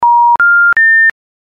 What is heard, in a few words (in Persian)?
robebala, بوق تلفن; انواع بوق تلفن و معنای آن